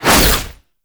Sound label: Whoosh, Incarnon Fist Claw; Warframe; Tenno